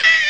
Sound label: ahh; ST-XX Amiga Soundtracker Sample Packs